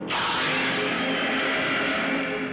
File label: roar; Varan; Japanese